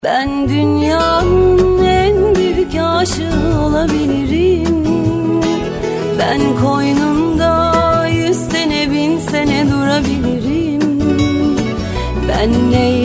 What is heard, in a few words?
Ringtone Singer